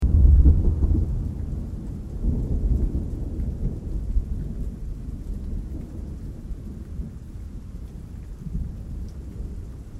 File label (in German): Regen